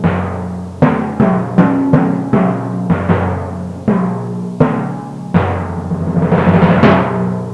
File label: timp